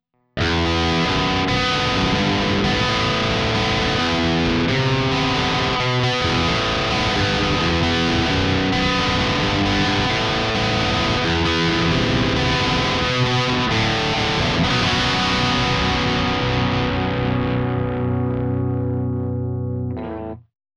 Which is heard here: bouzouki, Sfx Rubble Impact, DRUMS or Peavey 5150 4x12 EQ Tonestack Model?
Peavey 5150 4x12 EQ Tonestack Model